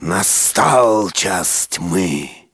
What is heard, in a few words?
hero